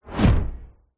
magic spell whoosh ball of energy imcoming hit thud; zapsplat